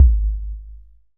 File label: 808 Kick Long; drumkits; hydrogen; TR808EmulationKit